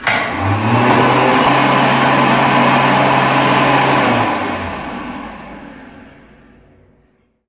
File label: hand dryer run